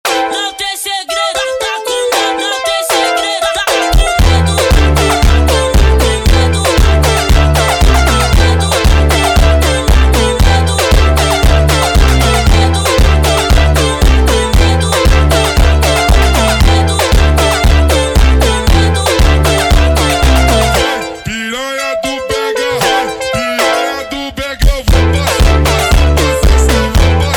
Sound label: Montagem slowed, funk